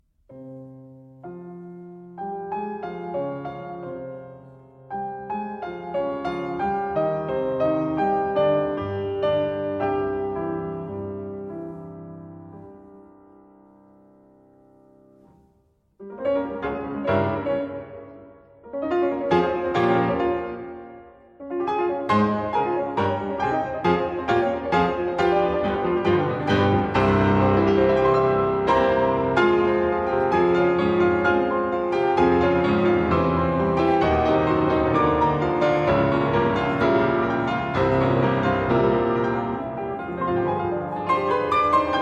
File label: Sonata For 2 Pianos In F Minor Allegro Non Troppo; Brahms